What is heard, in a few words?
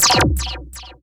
fx scifi; Ueberschall; Techno Trance Essentials